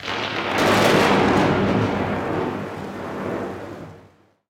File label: thunder